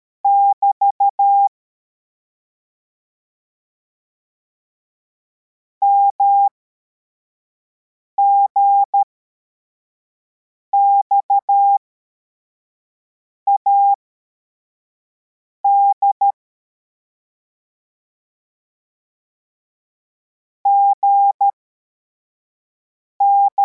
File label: cw, morse